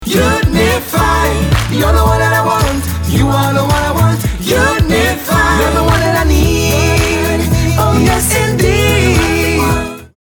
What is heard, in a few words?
JINGLE, ENG, Commercial; JINGLE - UNIFI (ENG) Commercial for Unifi